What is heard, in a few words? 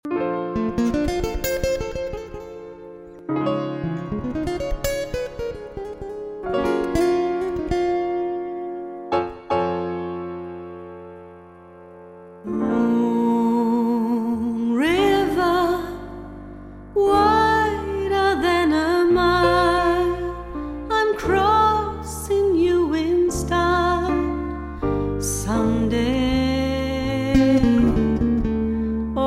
nightingale